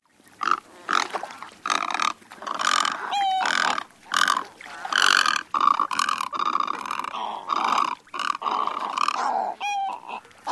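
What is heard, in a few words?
Great Egret, croaks of courting group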